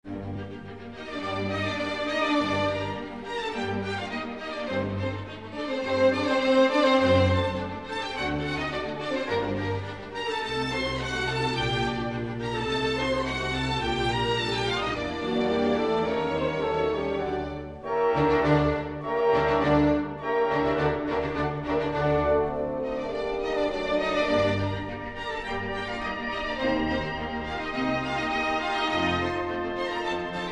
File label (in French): Symphonie no 40 instrumentale